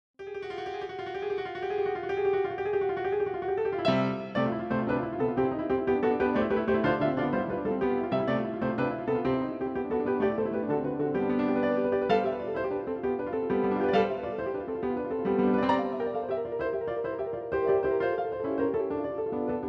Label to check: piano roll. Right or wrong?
Right